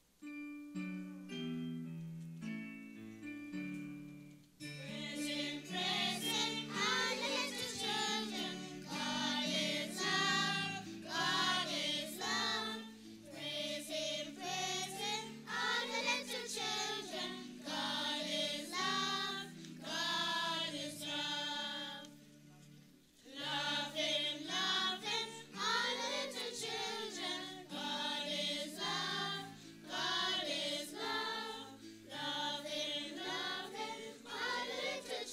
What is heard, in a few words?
Special Music Children Choir